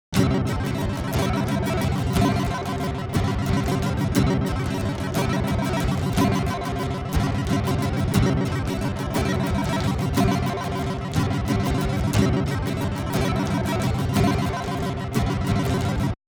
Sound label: slow vib